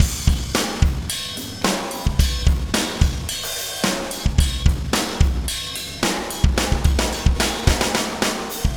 Beat, Processed Drumkits

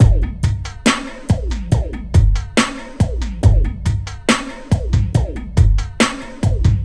SloMo Beats, Loops 140 BPM

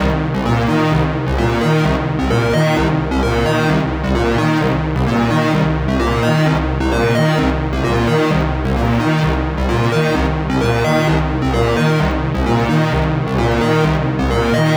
NRG Pad

